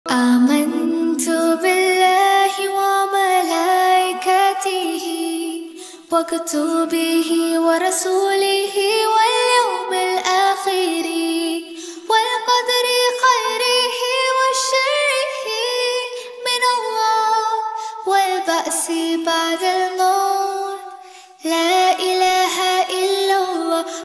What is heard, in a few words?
slowed arabic ringtone